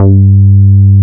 JUNO E-BS G3; Drums In Your FACE!

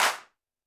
Roland TD-20 Percussion

